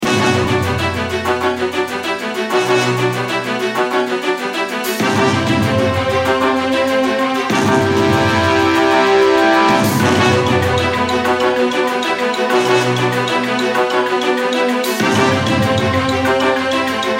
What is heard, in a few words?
Bgm Fantasy